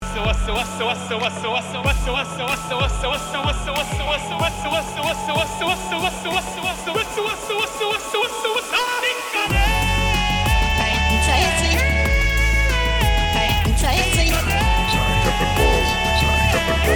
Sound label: ringtone android